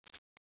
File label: slide release, weapons